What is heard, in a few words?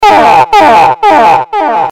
warning; CAC ASSETS